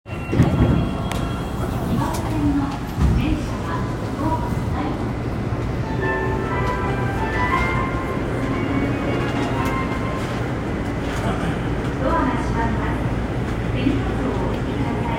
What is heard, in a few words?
train sounds; 半蔵門線錦糸町東